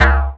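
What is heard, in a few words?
percussion metal, techno percussion; Roland L-CD701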